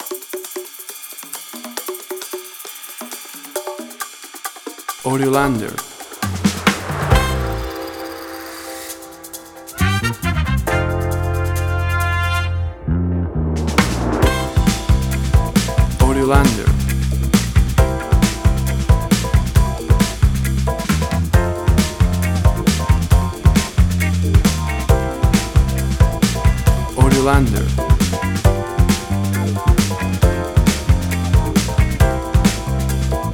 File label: Brilliant Comedy